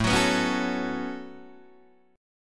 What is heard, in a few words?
A 6th Add 9th A down Guitar Open D